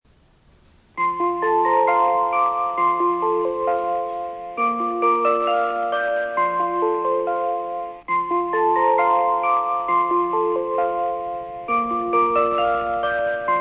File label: melody